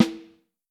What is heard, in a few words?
Snare Drum; Q Up Arts; Johnny.C's Vintage Snare Drum